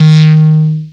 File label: D#2, Analog Synths; Hottbottom; Foundation; Ableton Foundation FreePacks